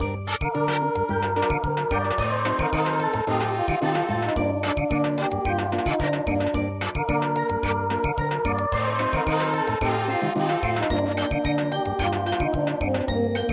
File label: Samba